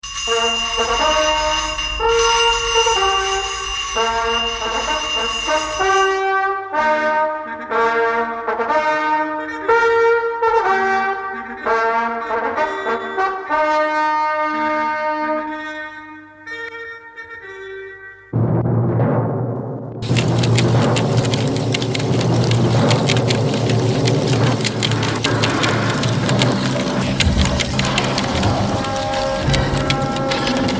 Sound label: Jingle; Route1